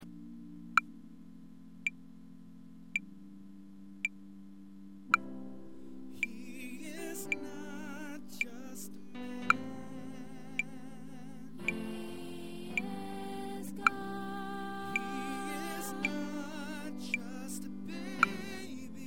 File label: Alto Chorus; Christmas